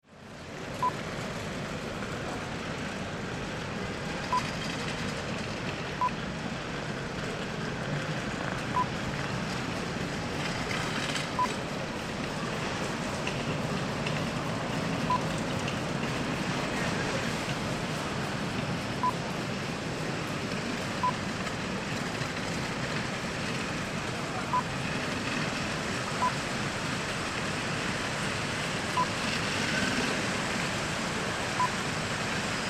Heathrow Outside Terminal 3

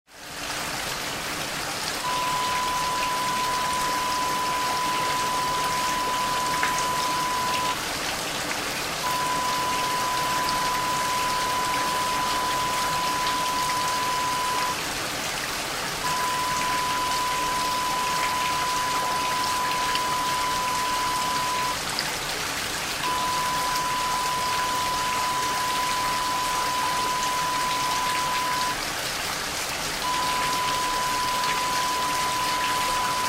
rain

